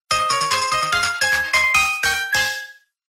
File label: ringtones; gmod; perp2.5